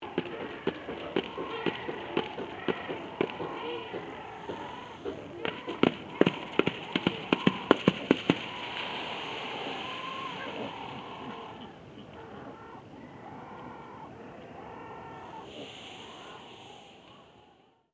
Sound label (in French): Envol cygne